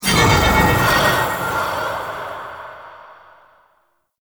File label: Sword Parry Stun; Warframe; Duviri; Dax; Weapons